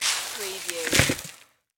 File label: Sand Pail Dump Bucket; sound effects bible